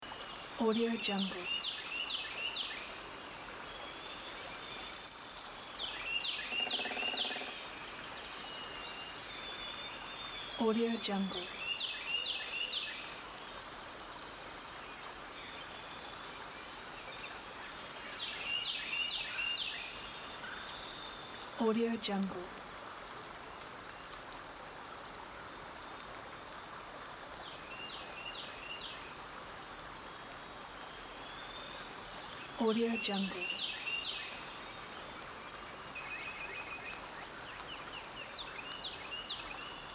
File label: jungle rainforest loop